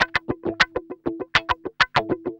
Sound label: GUI, LICKS